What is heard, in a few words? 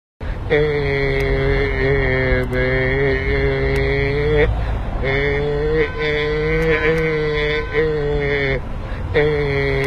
eeehhhh; diego maradona